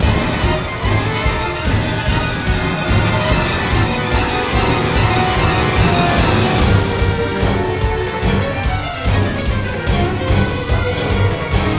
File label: Score Attack Battle Loop